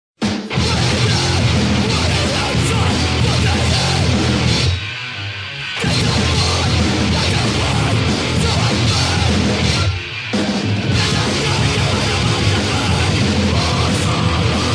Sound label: Extortion Isolated